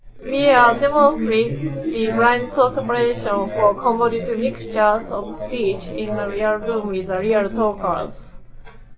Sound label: trio